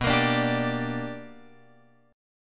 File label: B Minor Major 7th Sharp 5th, chord; BmM7#5 Chord {7 X 8 7 8 10} strummed